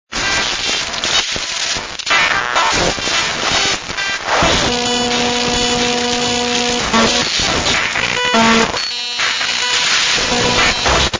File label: static, Sound Effects